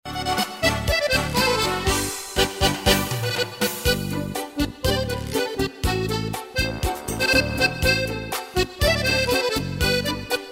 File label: organet cha cha